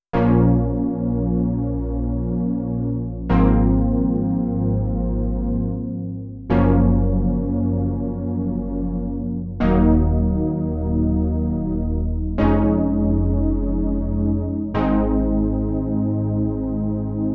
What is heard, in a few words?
Prophet 10 SSM2040 raw; TROCKEN MONO